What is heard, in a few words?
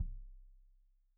Kik Stereo; Deeply Sampled Drums; SMDrum